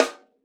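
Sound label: RAW snare drum, Drums Acoustic; AKAI; 90s sample CD; USB Soundscan vol.10; RAW KIT 7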